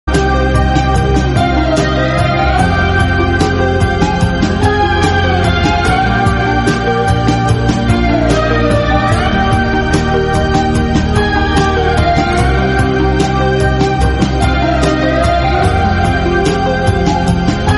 space instrumental ringtone

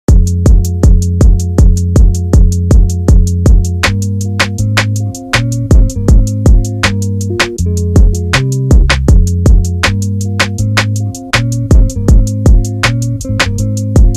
instrumental ringtone

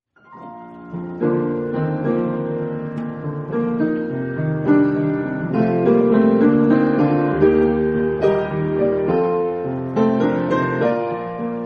PIANO RINGTONE

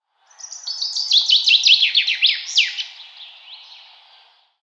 BIRD CHIRPING SOUND

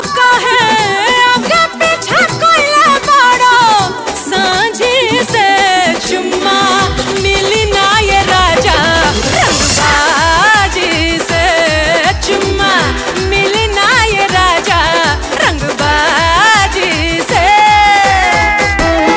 BHOJPURI RINGTONE

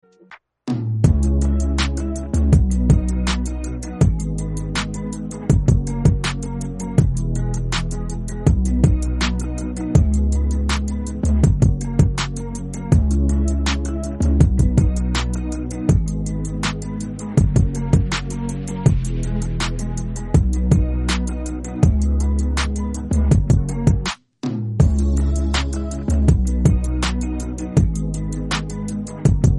INSTRUMENTAL RINGTONE

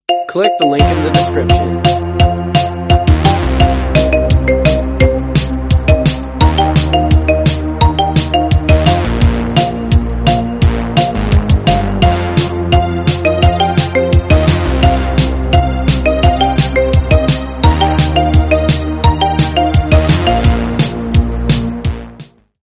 MARIMBA RINGTONE

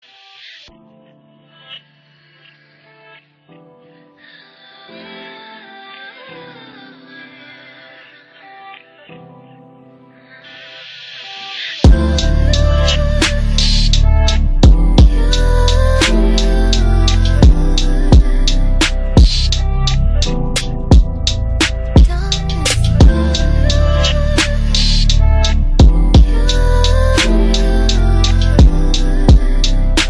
instrumental ringtone

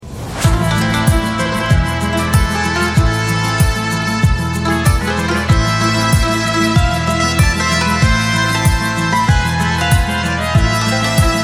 SPANISH PASSION RINGTONE

